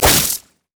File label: caustic spittle; LoL; kogmaw